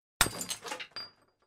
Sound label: Coffee Mug Breaking Sound Effect